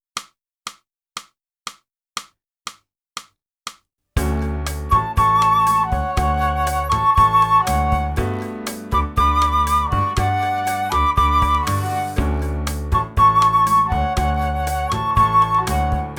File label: flute feeling sunny